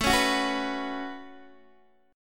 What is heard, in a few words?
A 6th Add 9th, Guitar, DADGAD